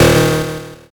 zap

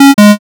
error

